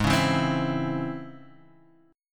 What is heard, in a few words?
C sharp Minor Major 7th, chord